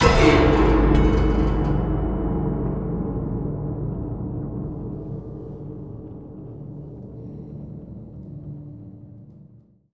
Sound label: Crash Face, Cinematic Metal Impacts